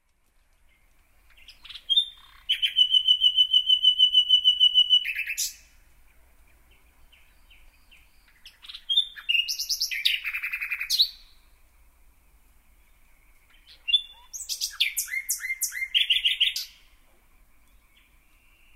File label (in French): rossignol philomele